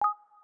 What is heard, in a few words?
Popup, Audio User Interface; samsung YP-U5